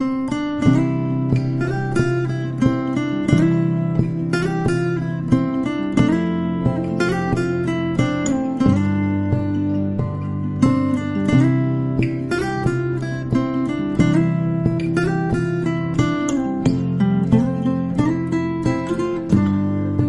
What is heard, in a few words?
dreamy romance